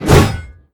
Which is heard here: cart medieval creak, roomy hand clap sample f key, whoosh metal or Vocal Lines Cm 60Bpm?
whoosh metal